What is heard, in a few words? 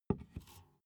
big can filled put down hard